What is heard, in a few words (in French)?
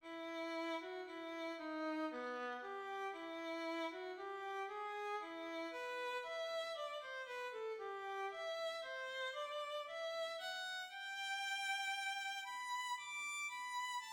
VIOLON; ALLELUIA de Schutz